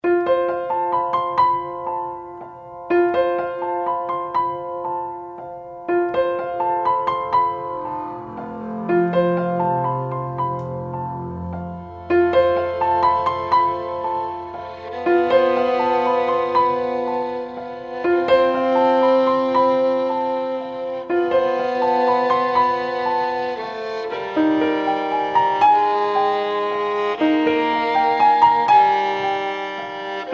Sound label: piano